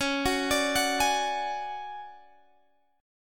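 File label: D Major Sharp 11th D flat, chord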